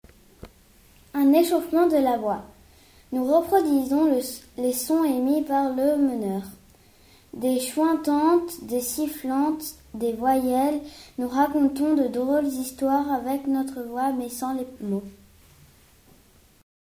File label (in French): échauffement par imitation